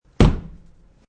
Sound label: Thud, Thudding sound.; Thuds; Objects